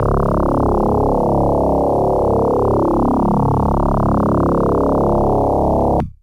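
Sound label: Electric Bass DX100 C#1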